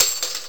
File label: coin; Blingo 1.2